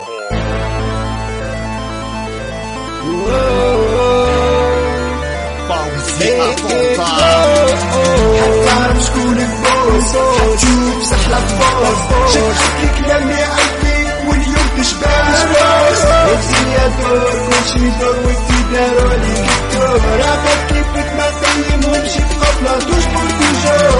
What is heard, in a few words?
dz rap